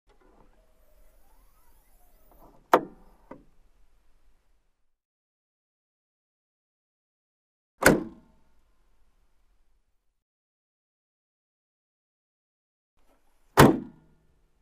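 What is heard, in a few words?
Sound of hood opening and closing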